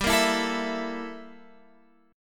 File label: G Minor 6th Add 9th G down